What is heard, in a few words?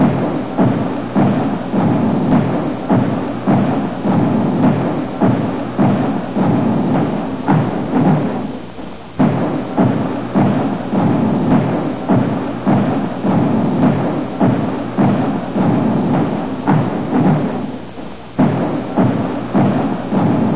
drum; race-into-space